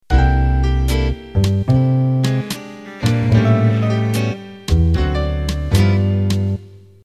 Big Band Ballad Intro

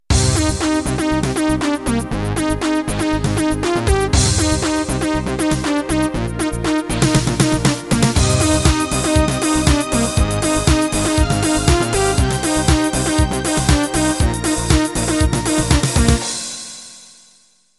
Tekno Intro

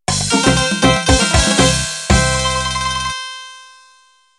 Cifteteli End

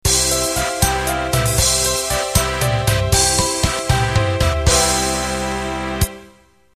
12-8 Pop End

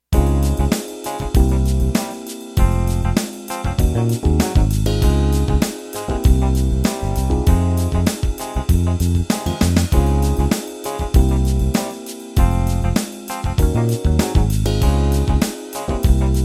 16beat ballad

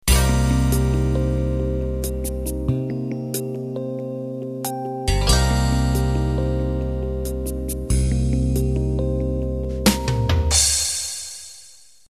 Slow Rock Intro

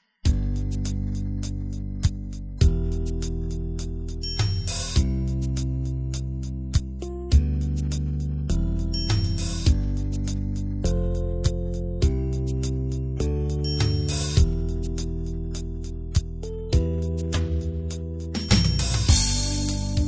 color beat

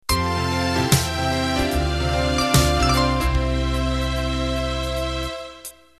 Swing Rock End

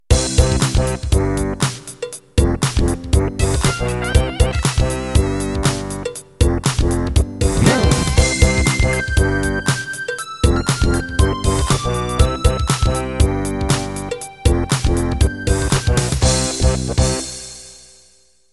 Rap End

